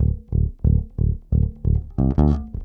Eighths and pickup

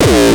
kick; shock. Ultimate Kicks vol. 2

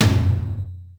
Yamaha RM 50 Toms

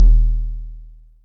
kicks, Bass Drum

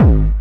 Hard Kick

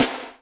snaredrum, Yamaha RM 50

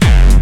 HardCore Kick

